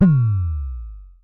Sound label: Synare Filter; Star Instruments Synare 3